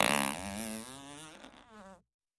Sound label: Character Fart; farts